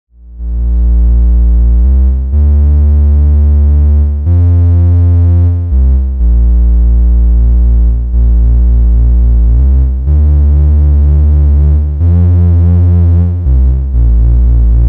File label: Klavis Module Twin Waves Hovering Tri Bass